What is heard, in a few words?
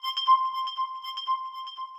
Wii U - Loading; eshop